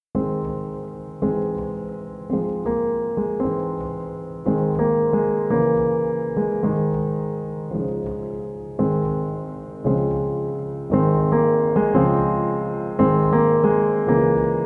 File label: Brighter Days (Instrumental); Blitz